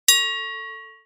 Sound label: pickup; lego